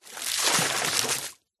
nature water pour fast short from bucket into deep water